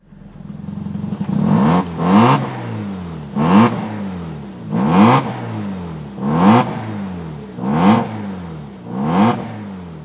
exhaust note

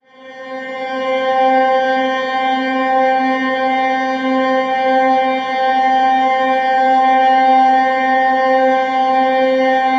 Guitar Pad C; ESW Sphere

